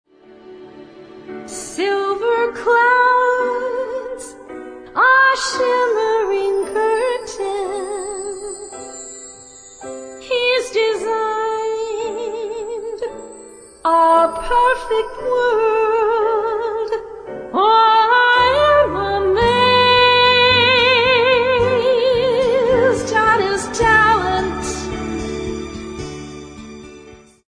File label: heart